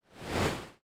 Swoosh Transition

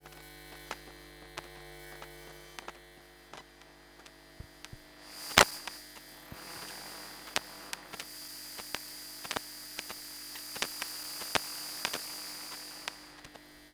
Long Buzzing Soft Popping Power Glitch

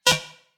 Single UI Beep

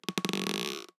Elastic Rubber Creak

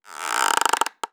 Clean Decelerating Creak

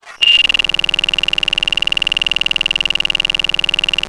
Digital Buzzing Rattle Glitch

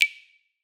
Synthetic Clang

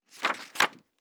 Rustling Paper Page Turn

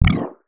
Sci-fi Pulse Gun Blast

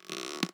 Elastic Rubber Creak

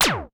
laser beam phew

